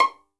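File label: violin pizzicato C 5; AKAI S-Series CD-ROM Sound Library VOL-7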